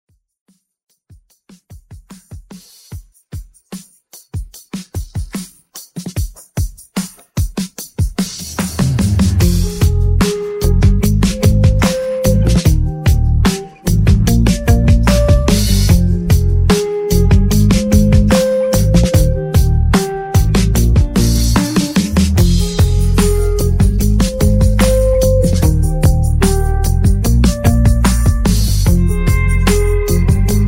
Country Intro Beat

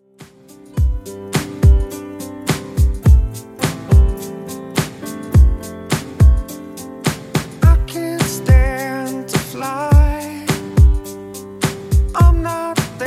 105bpm

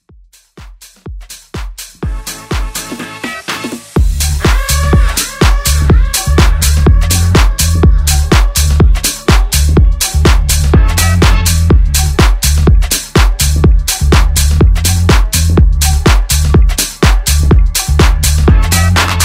Transition

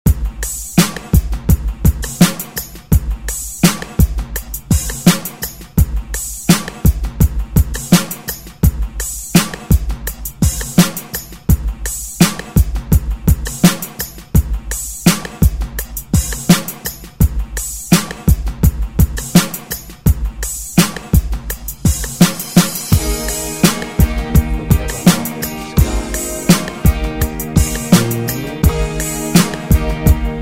Rock Redrum; Deejay Hit

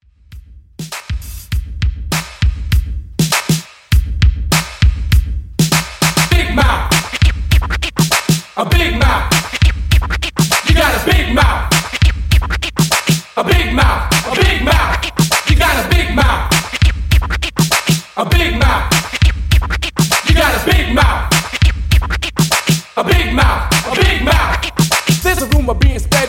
Party Intro 100 Bpm

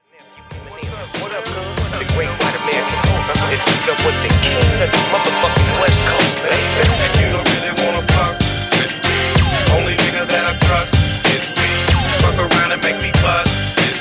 Mashup Redrum 95Bpm Dirty

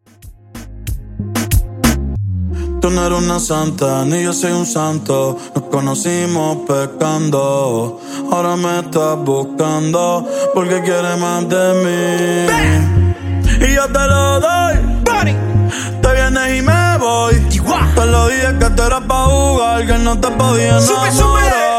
93 Bpm; Dj Intro Outro

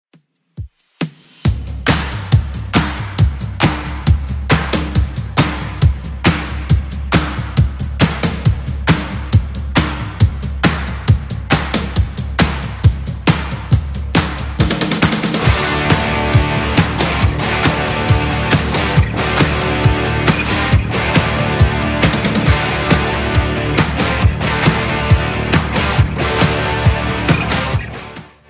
80s Rock Redrum 137 bpm

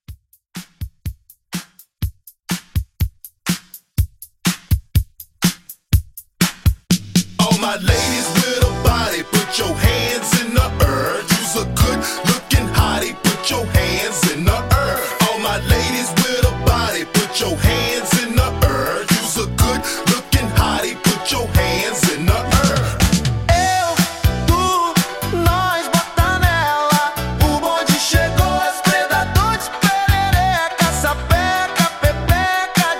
Hype 123; Tiktok Hype Edit

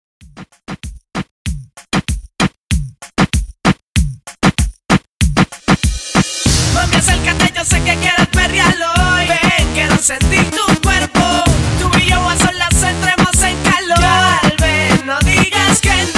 In Outro Reggaeton 96 Bpm

